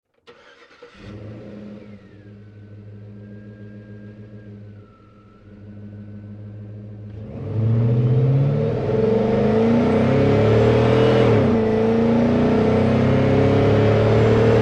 Truck GMC Savana Onboard Drive Accel Decel Fast; 2010 GMC Savana; Trucks

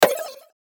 CLICK WARBLE; Clicks; Blastwave

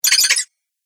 A Critter Sound - Game Fx; Video Game Sound Effects

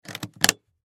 Case Lock Latch, Case Lock Latch Luggage; Latches (Sound Effects:Objects); Foley; Blastwave

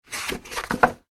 household book cover page turn; Books; Offices; McKinney Sound

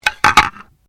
Bones Human Move; Material components for constructing more complex sounds; Tapping; Objects

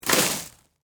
Snow Crunch Icy, Grass; Weather; Snow Sound Effects; Snow (Sound Effects:Weather); BlastWave

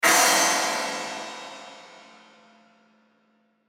Hit Metallic, Hit Metallic Crash; Metallic Sound; Blastwave

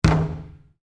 Basketball Rim, Sports, Bounce

